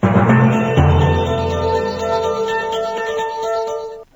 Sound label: jingle; jt nuit france 2